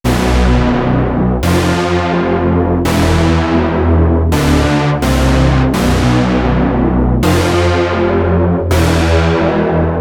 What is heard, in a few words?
10 voice unison stack